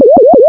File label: Funny UFO; HyprFrog Demo